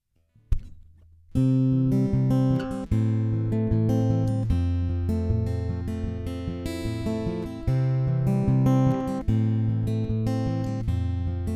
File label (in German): Pickup Ton Scooped